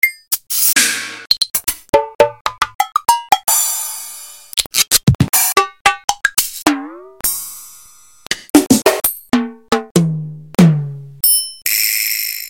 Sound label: EMU SP-12